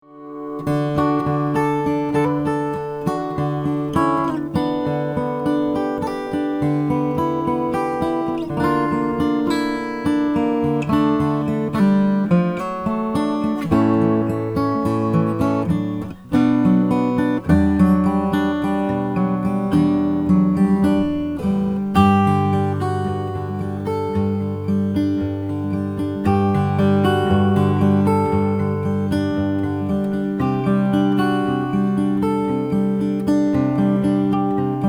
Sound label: gibson j 50 mahogany